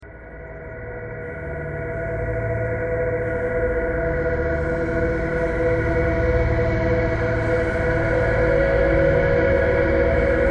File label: thread simple